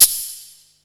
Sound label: SHAKER, DRUMS; SoundFactory; SMPMANIA